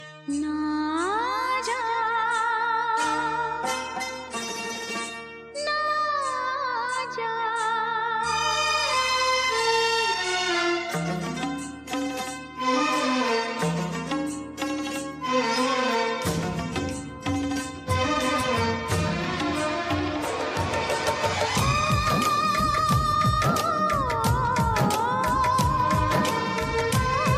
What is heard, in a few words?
instrumental ringtone